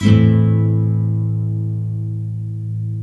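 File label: NYLON A MINOR UP, CHORDS, Guitars; Spectrasonics; Hans Zimmer Guitars VOL-1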